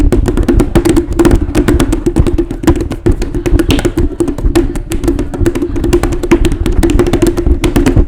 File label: fall from stairs